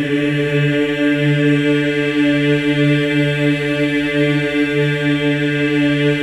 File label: EES D4, MEN EES OCT, Symphony of Voices; Spectrasonics